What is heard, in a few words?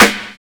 SD, Drums Dance & Groove; USB Soundscan vol.11; AKAI; NYORK KIT